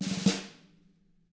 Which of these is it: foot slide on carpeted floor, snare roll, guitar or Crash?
snare roll